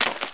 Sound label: mud